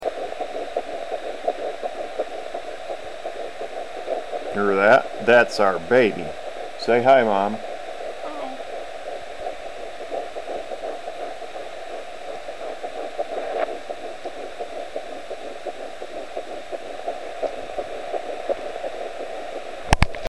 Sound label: heartbeat